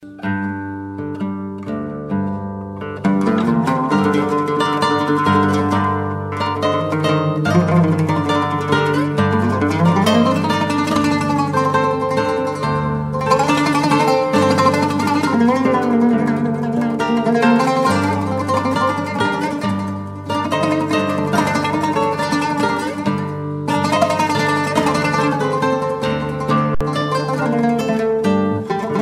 Guitar and Lute

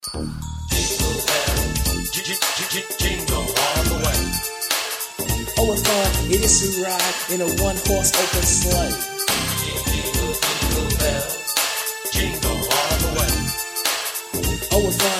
jingle bells rap

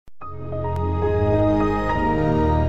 start music

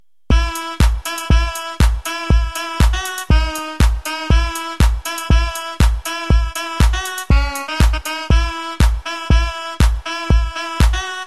catchy beat; hip hop

